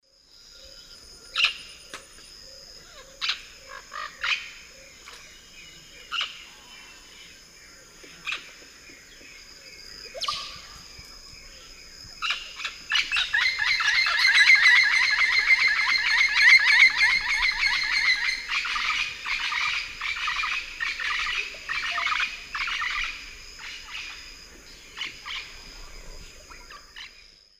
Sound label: Amazona amazonica, calls; S. America; Eastern Lowlands